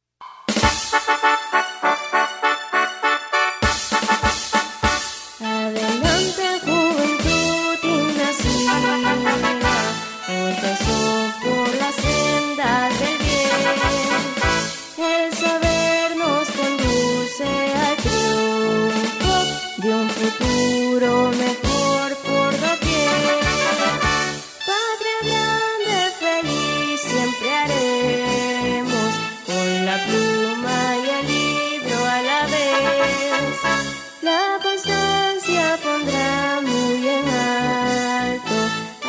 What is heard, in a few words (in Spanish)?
Himno al colegio; nuestros simbolos